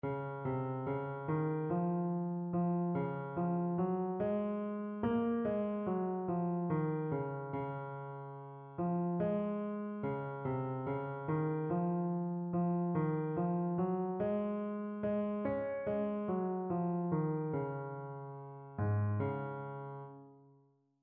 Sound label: melodic error detection; ear training